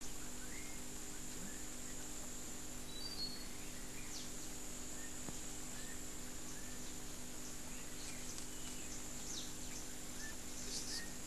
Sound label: Golden-throated Barbet